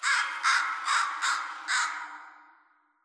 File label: amb crow caw